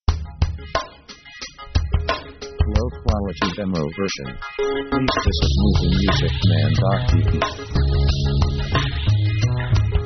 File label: india hop, synth